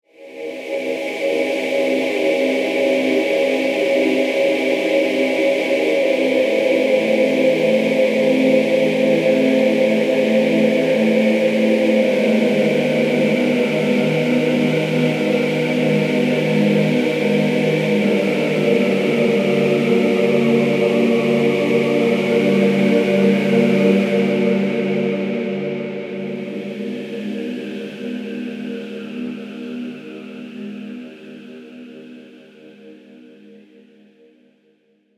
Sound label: choir; sith